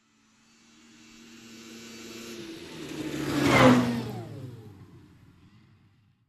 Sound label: Motorbike passing by fast